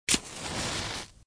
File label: Match Striking